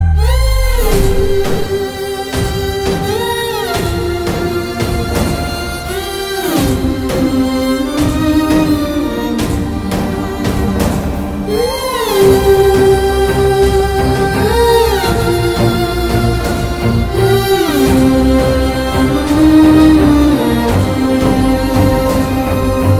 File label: Love BGM Ringtone; Baahubali OST